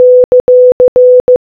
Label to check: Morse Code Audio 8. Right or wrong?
Right